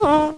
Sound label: Exhale; Adium